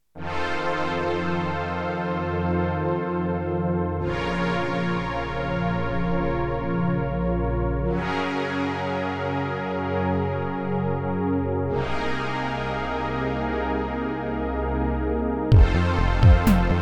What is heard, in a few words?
analog